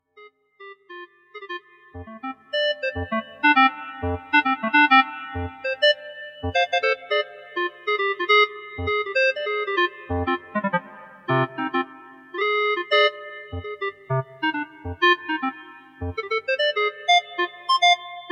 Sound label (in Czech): Klarinety na jazzove procházce